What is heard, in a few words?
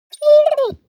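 animal dog German shorthaired pointer whine trill; gsp; smartsoundfx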